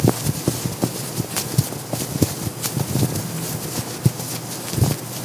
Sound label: falling foley loop; Aragami